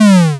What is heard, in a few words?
error